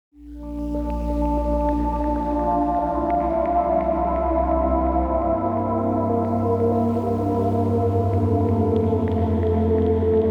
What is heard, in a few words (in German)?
ambient pad mit hall